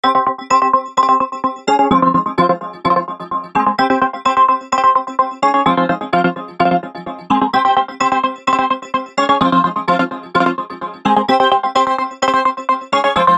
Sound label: Synth Chops